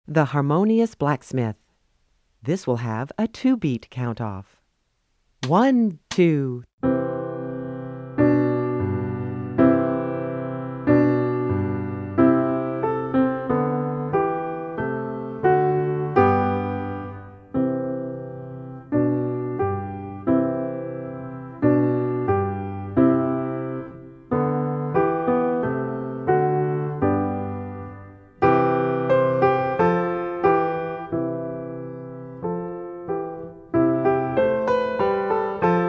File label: The Harmonious Blacksmith Piano; New Directions for Strings Book 1